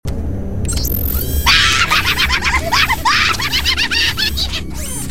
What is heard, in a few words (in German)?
gremlin lachen sms ton; gremlins